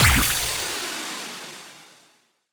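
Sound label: Music Level intro End; Splattag; Crayola Scoot; PS4, Switch, Windows, Xbox One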